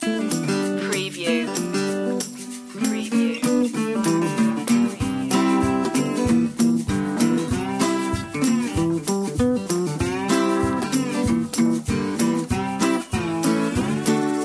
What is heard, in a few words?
rooster chasin blues